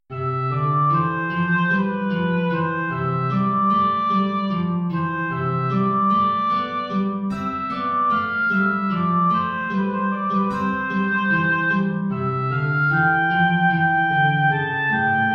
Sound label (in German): Arie Oboe Gitarre; BWV 212-4